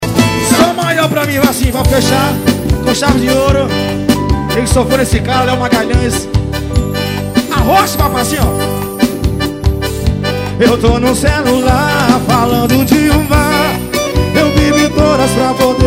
amigo locutor

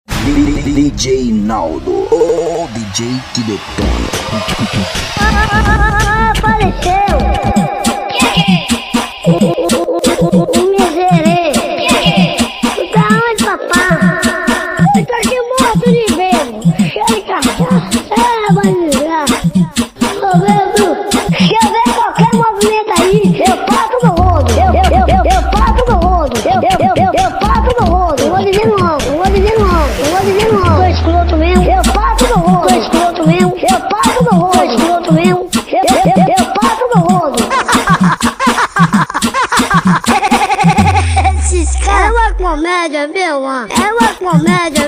funk

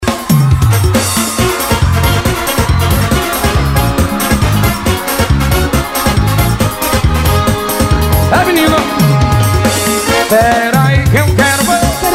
forro ao vivo

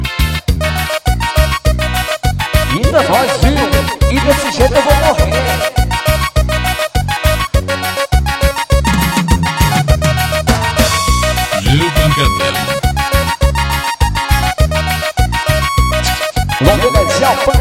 forro ao vivo; em perus

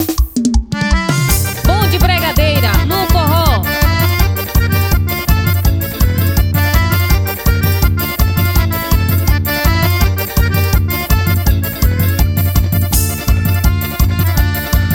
pagode

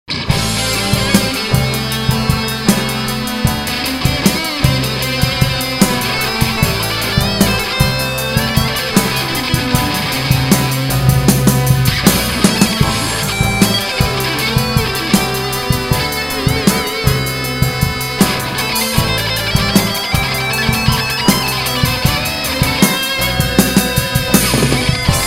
instrumental

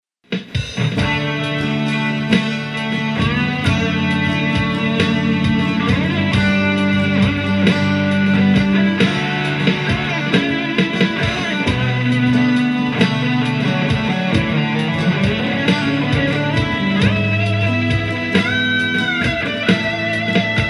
improviso

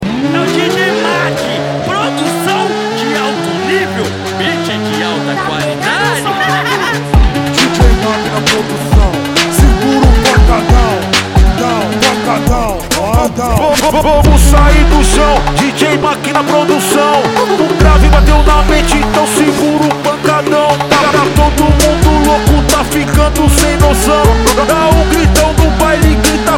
beat envolvente

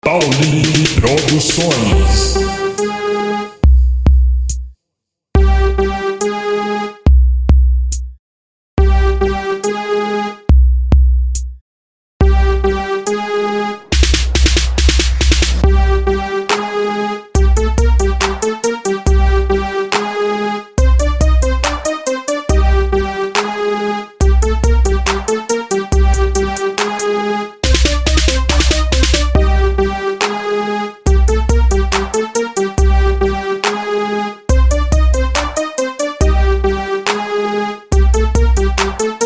base rap

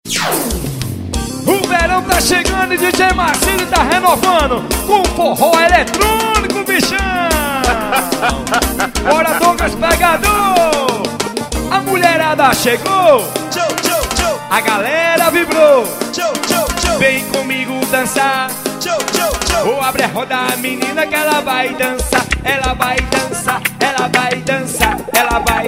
forro eletronico

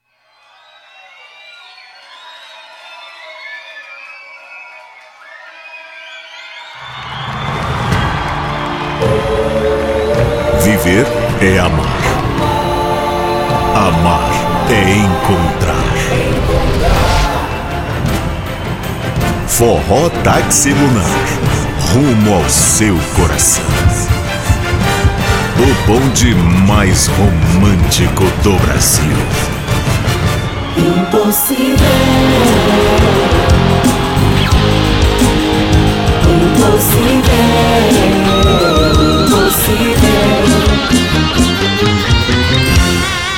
abertura forró

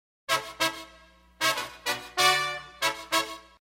intro metais

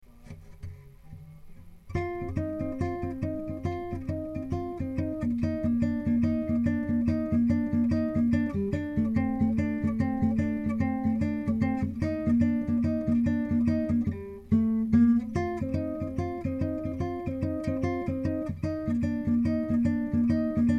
instrumental

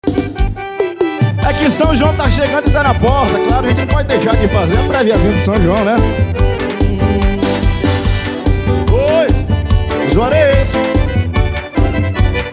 aboio de vaqueiro, forro

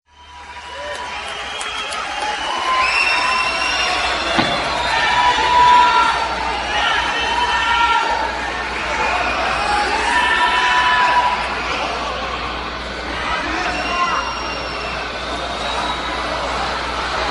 intro vinheta rock; rock in rio

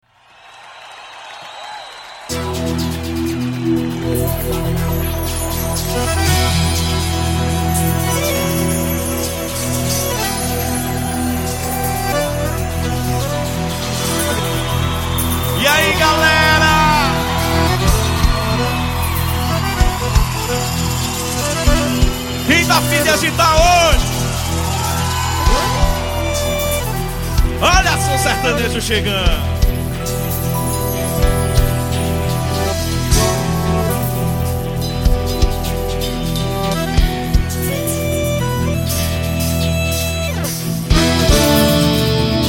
sertanejo

